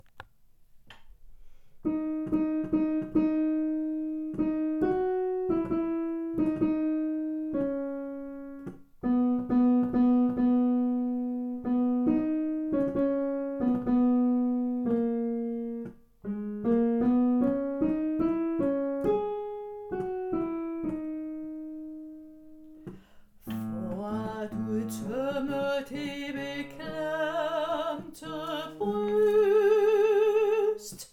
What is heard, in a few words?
BAS; Tit er jeg glad